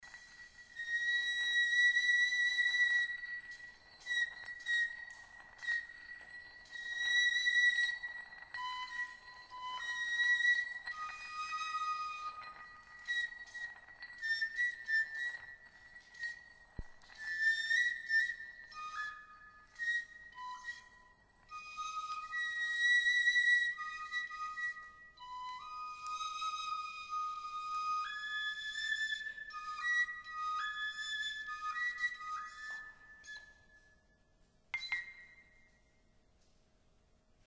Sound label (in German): stein floete